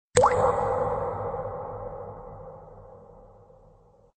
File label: Echo Drip